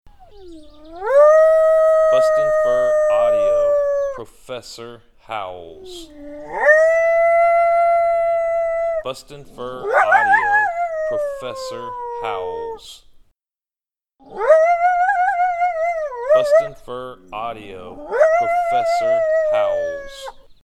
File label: Howls; Professor